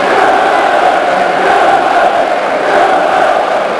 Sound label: Chant, Crowd; David Beckham Soccer; XBOX